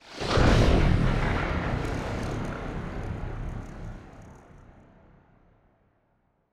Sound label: ship corvette move; Stellaris; machineage; ships